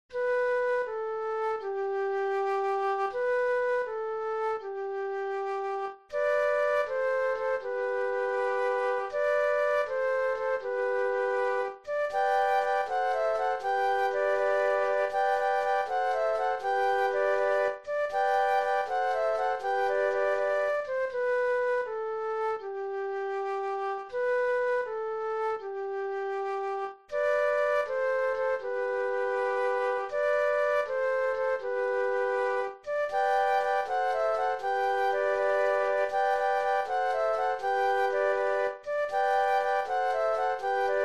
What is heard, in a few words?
flute trio